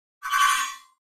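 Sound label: Pop Up Electric Whip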